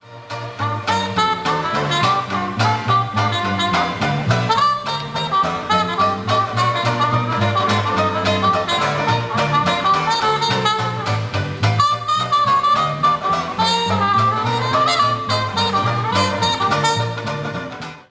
acoustic trio banjo bass trumpet

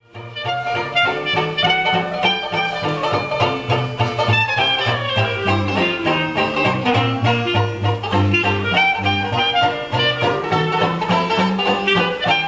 acoustic trio banjo bass clarinet

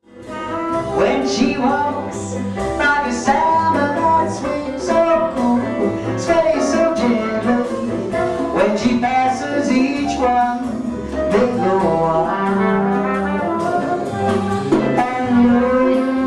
jazz quintet piano bass drums trumpet vocals

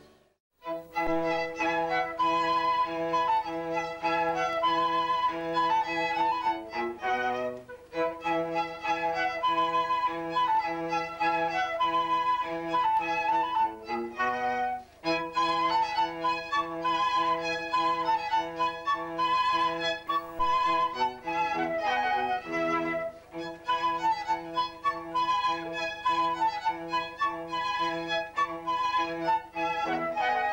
strings trio flute violin cello

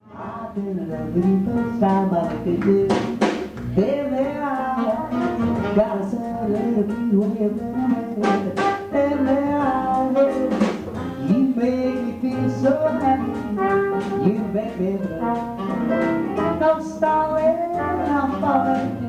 jazz quintet piano bass drums saxophone vocals

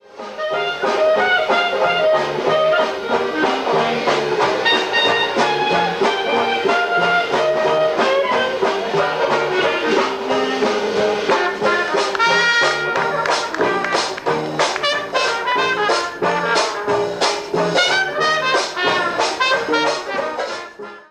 acoustic quartet banjo bass clarinet drums

